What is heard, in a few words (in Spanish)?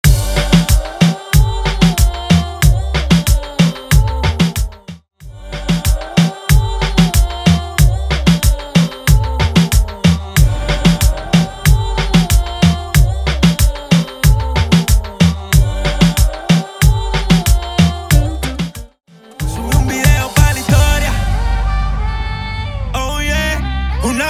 Cubaton Intro Outro Clean 93 BPM